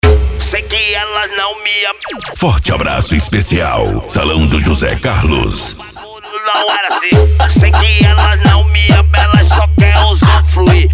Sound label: funk mega bass